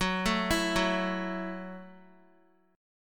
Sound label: Gb Minor 7th, Guitar; Gbm7; arpeggiated; x,9,7,9,6,x